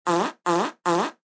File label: Raspberry Three, Raspberry X3, mouth FX (fart), funny; human voice; Exclamations